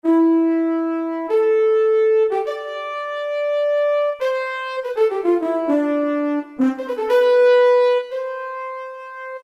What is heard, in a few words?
Flugel Horn; Roland PN-D50-03